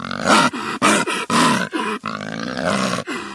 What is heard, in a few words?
tushkano aggressive